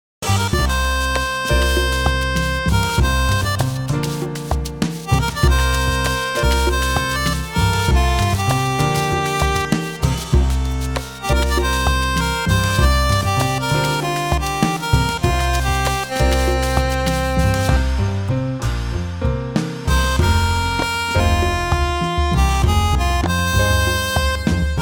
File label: Rain Slow